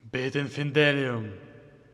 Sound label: agression, satan; customnpcs